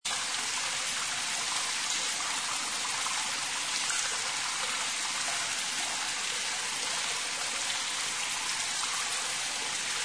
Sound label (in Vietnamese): Tieng thac nuoc do